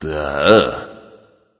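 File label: non-verbal ugh; smite; voicepack; houyi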